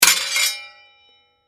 Sword Strike Impact Hit Ring; Sword Strike Impact Hit Scrape Ring; Swords (Sound Effects:Combat); Blastwave; Warfare

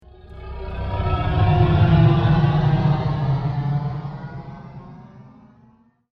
Engines Of Future Fire, spacecraft; Andrew Fleming

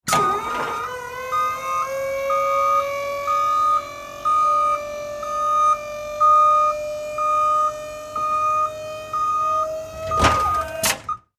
Fire Truck Ladder Raise Hydraulic; Emergency Vehicles

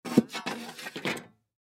Saw Set Down Wood Workbench; Machines; Industry

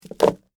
Rope Drop Wood, Surface, Heavy, Rope; BlastWave

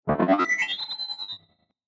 Low Robot Scifi Beep Interface Delayed Space; Sound Design Future Telemetry; Tom Hutchings

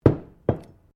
Bottle Glass Place Bench; Various bottles; Materials; SoundEffects-Foundation1

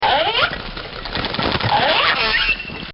Whale; Animals; recorded in a custom built sound booth

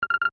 UI Confirm, User interface feedback sounds

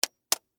Crunch Crack Egg, Shell; Texture; Vegetable; Crunches; BlastWave

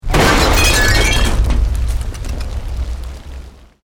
Explosion Medium Brick Wood Glass

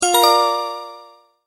multimedia tone start

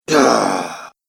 "Yaaa", Male Strength; Recorded Voice Sound Effects

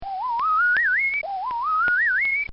Cartoon Sound Effects Whirls; Cartoon Sound Design

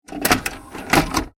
household vhs load cassette; Vhs Video Recorder Loads Cassette; Miscellaneous Electronic; Electronics; Vhs Recorder Loading Tape

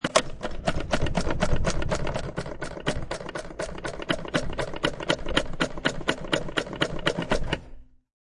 Using soap dispensor.; Public Bathrooms; Offices

